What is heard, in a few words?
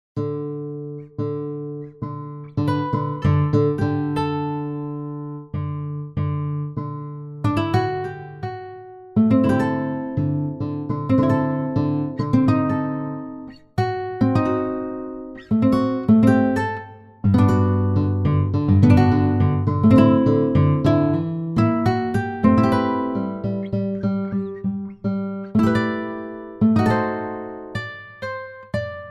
JAZZY GUITARE